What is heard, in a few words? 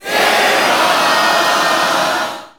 SE GAME SLIDE; maimai